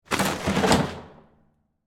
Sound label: Paper Towel Tear, Office, Paper, Towel, Dispenser, Tear, Sheet, Public, Bathroom; BlastWave